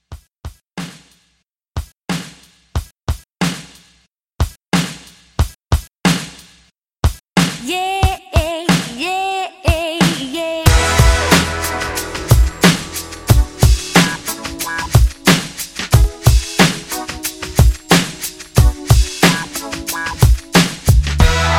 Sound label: 90s Pop Mixshow Re Drum 91